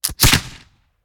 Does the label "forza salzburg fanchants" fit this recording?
No